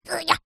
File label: cartoon small character high pitched voice fight vocalisation